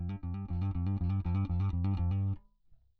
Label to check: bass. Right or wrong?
Right